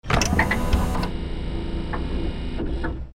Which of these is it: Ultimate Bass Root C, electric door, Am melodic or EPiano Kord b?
electric door